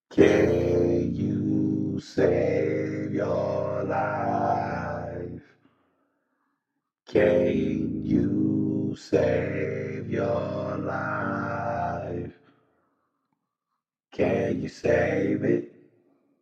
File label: hip hop 120 D sharp, Acapellas